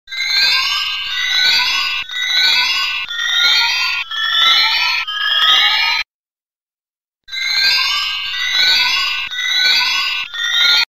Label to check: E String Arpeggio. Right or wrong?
Wrong